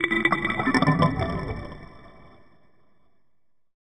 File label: Blub; Sonic Foundry (Sony Creative Software) - Future Retro Dance Excursions